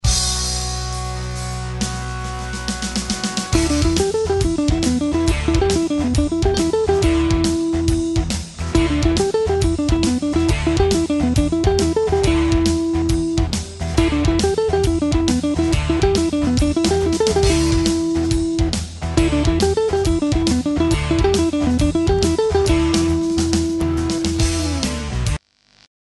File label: Asc Desc Mix Triplets; D First; Penta Blues Patterns